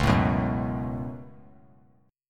C sharp 7th Suspended 4th Sharp 5th, C sharp, chord; strummed; 13,16,14,16